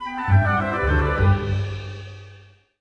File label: Jam Intro Stinger; Candy Crush Soda